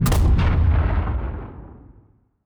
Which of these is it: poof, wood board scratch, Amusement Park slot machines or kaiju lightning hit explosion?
kaiju lightning hit explosion